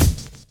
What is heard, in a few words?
DRUM CHOP; AMG Gota Yashiki Groove Activator